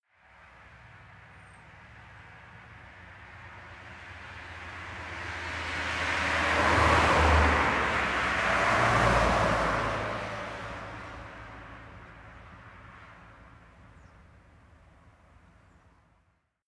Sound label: car double drive by medium speed